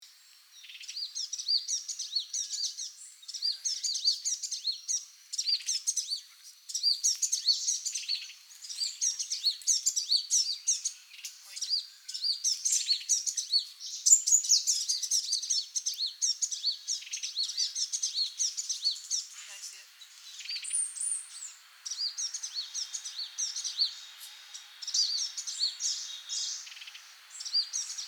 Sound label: Muscicapa ruficauda call; passeriformes; muscicapidae; ficedula ruficauda